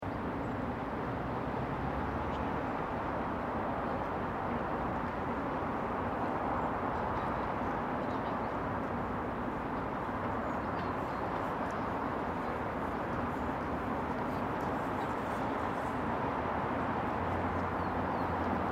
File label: Traffic Semi Distant Light Birds, Light city ambience; Feeling of a distant city with light spring birds. Medium movement from road with some oc